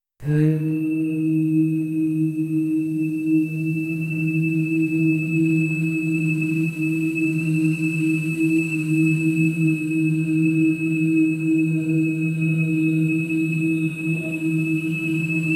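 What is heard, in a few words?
create a vocal track chant, meditation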